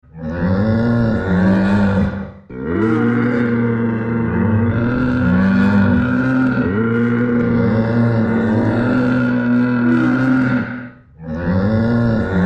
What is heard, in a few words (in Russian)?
verblud